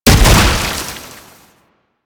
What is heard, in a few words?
Proto Infested Brachiolyst Projectile Explosion; Warframe; Abilities